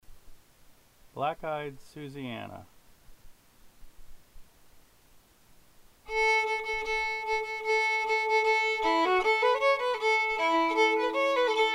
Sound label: fiddle; festival tunes